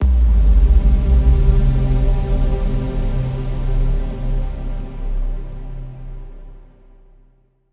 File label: Kick FX - Cm 60Bpm; Construction Kits; STEMS; Ghosthack; SkyRealm; Kit 06 - Before The Storm